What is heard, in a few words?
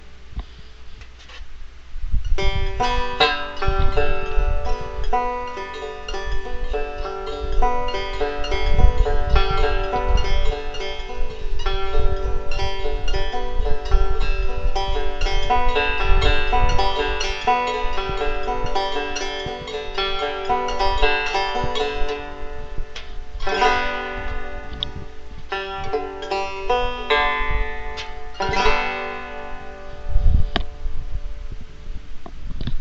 banjo